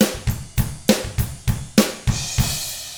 ROCK, Drums; ILIO; Double Platinum Drums 1

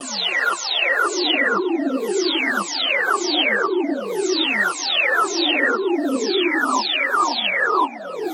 115 Perc Worm Hole; Zero-G; Future Wave

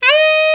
TENOR SAX D#; Northstar - New Gold VOL-1

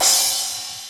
Crash up6; Digital Sound Factory

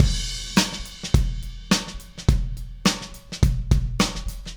Beat Crash; Sonic Foundry (Sony Creative Software); Indie Rock Anthems

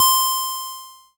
ELKA, String Machines; ELKA RHAP

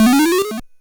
Drums, Hits; DCM8

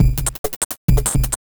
808 rez, Breaks; neuro; Sinistarr

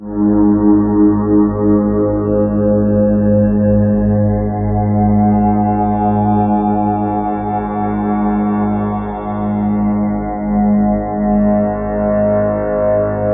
BIG SWEE, Ominous Drones, SYNTH SND; USB Soundscan vol.48; AKAI

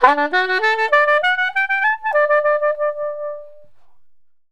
SAX, Balkanish Traditions

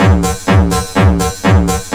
FXBEAT; Big Beat Drumloops; FX BEATS; AKAI

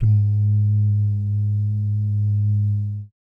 BS DUMM, Vocal Planet Jazz & FX; ILIO; BASS DUMMS

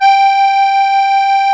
HOHNER, akkordeon

